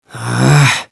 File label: hrrrgh